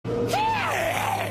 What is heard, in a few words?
vampire noises